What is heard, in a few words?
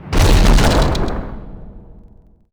meteor